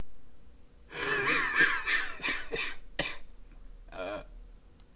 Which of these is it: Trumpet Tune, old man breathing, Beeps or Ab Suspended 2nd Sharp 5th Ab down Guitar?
old man breathing